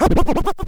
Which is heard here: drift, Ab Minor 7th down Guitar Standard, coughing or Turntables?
Turntables